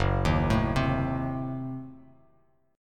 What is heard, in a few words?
F sharp Diminished 7th F sharp Bass Standard; F#dim7 arpeggiated